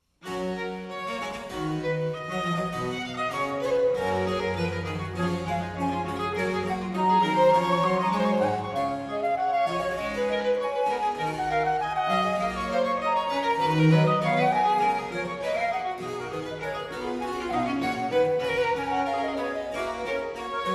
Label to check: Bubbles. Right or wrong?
Wrong